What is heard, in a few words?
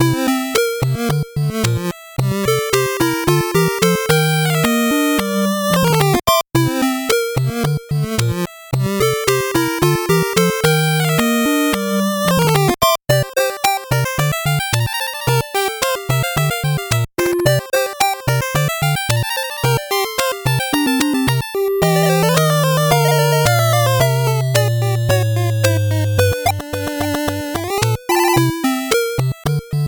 8bit bgm normal